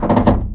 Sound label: metal box strain; physics; Garry's Mod; hl2